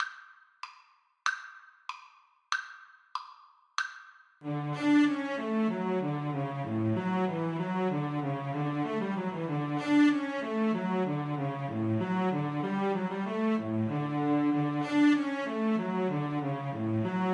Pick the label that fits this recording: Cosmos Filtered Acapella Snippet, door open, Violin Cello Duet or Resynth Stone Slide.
Violin Cello Duet